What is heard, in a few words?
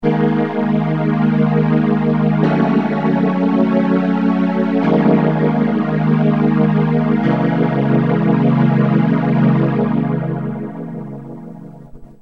m1000 mti ensemble